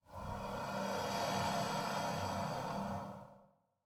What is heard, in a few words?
low hiss, alien voice